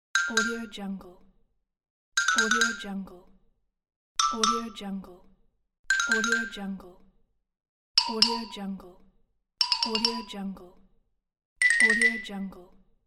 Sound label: cartoon blinking eyes